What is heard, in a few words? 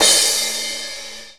Acoustic Crash